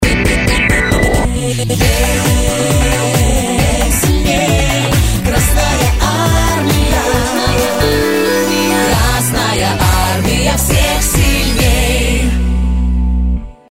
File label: pop